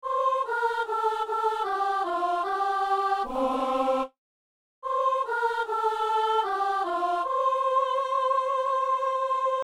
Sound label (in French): psaume, soprano; Psaume 125 (126); Messe du 17 janvier à Saint-Denis